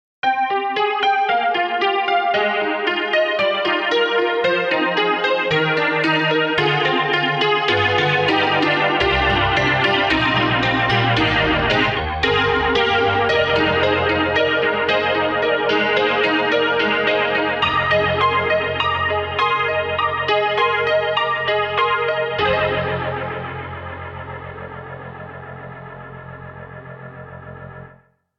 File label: KORG KRONOS 2 Piano